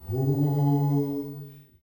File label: soft, male; Spectrasonics; Heart of Africa 2; S.MALEAMB